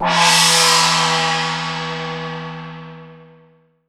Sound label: WU HANG G, GONGS; Voice Crystal - Steve Reid Definitive Percussion